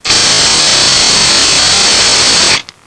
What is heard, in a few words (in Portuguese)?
chiado